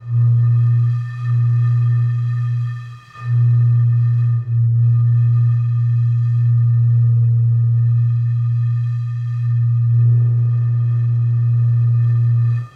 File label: Whistle Stone Champagne A sharp Loop; Journey